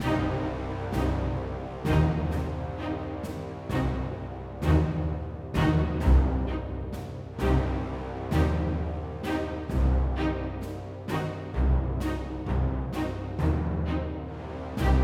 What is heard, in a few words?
Sneaky Loop